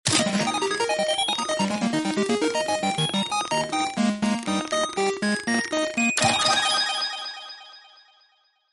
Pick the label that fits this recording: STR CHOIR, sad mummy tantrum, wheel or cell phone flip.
wheel